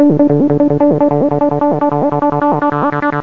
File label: 303 Big loop